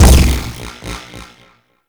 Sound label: UI Common Accent